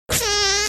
toot; marsupial

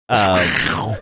making panther growling sound

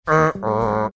imitating uh oh drop

